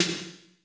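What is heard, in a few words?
wet room snare 808; Masterbits - Soniq Elements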